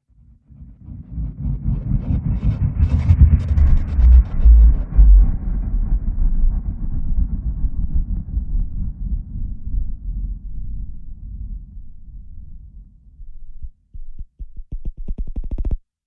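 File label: Sub Fltr, sub filter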